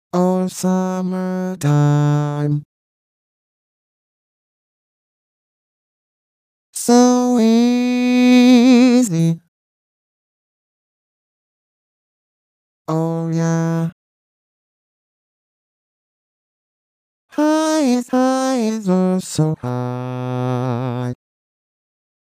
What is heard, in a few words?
Back Low (voice only); vocaloid